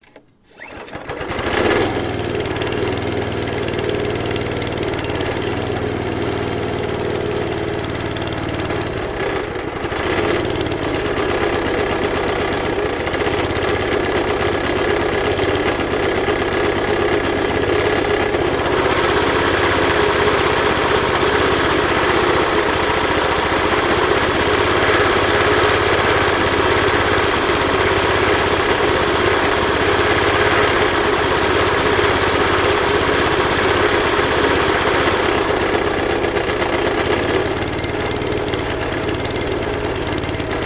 lawn mower petrol Qualcast Suffolk Punch 14s cassette Start Run Stop; selectmood